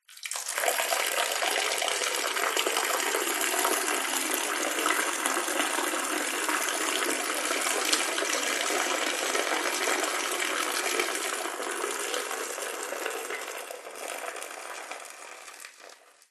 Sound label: human male urinate pee toilet foamy soapy water bubbles room reverb; zapsplat